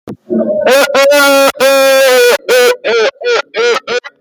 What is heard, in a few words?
goofy scream